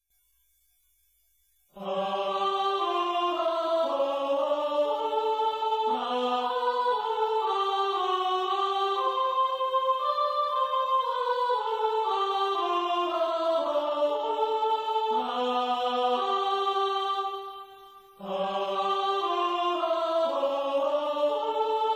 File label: sopran